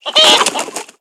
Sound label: animal chicken disturbed